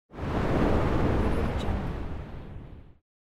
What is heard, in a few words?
tornado swirl; tibasfx